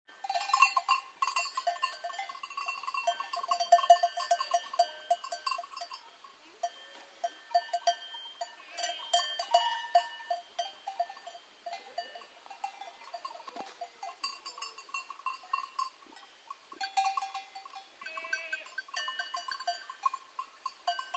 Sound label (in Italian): caprette al pascolo